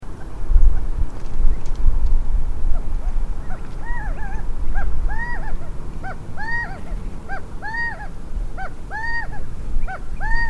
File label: Gambels Quail